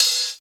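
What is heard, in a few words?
Closed Hi-Hat, Dance Drums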